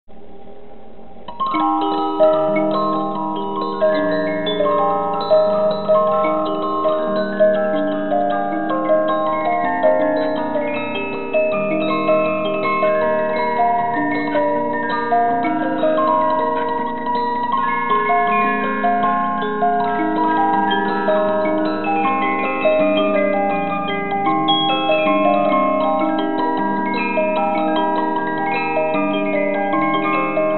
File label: musicbox